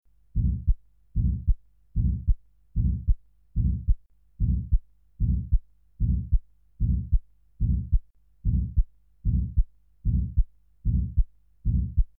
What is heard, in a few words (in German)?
Valvulaere Pulmonalstenose, Heartbeat